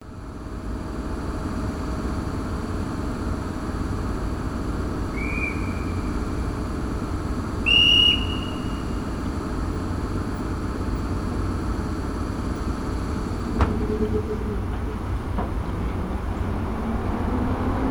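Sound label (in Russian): звуковые эффекты проезжающих мимо электропоездов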